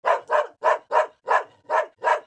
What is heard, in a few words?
dog; Virtual Pool Tournament Edition